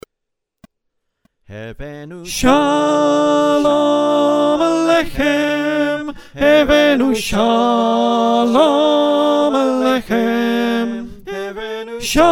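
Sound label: alto, choir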